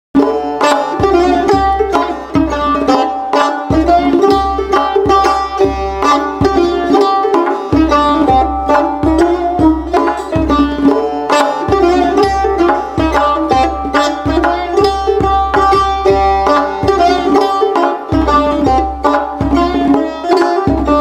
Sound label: Tar Tonbak, play back